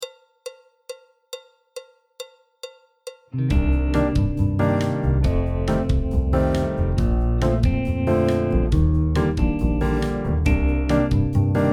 Melanita BT, clarinet, tenor, trumpet; Jazz Syllabus Level 1

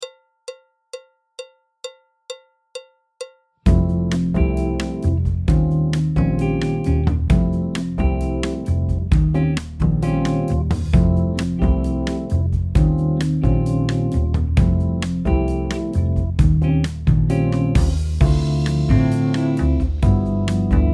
Easing BT, alto, tenor, trumpet, trombone; Jazz Syllabus Level 3

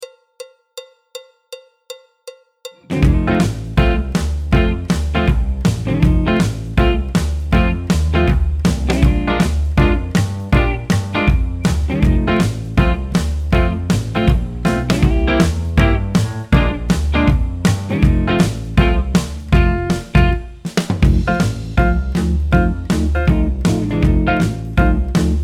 alto, tenor, trumpet, trombone, piano, Swing; Jazz Syllabus Level 1

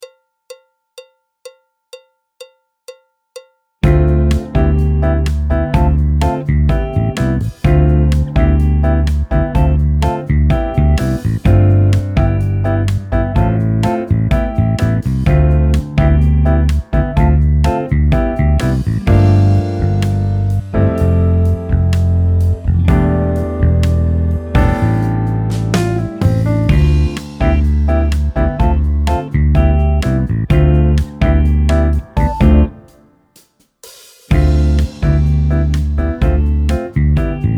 alto, trumpet, trombone, piano; Jazz Syllabus Level 3

